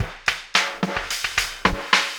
Ripple Perc

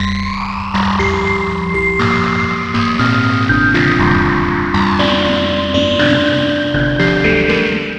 Dusty Time Synth, Synth SFX; Sonic Foundry (Sony Creative Software); The Electronic Music Manuscript Collection

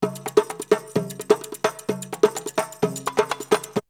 Drumloop; ZERO G - Datafile DISC 3

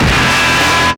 STAB

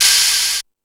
FX Sounds, Drum Machines E-MU Pro-Cussion

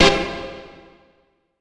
NRG Pad

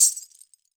wrist, world percussion; World Instruments 2

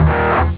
elec gt strum; jazz jackrabbit 2

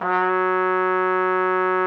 TROM F#3, TRUMPET; Xsample VOL-3

